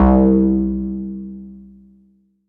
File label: C Seventh Stab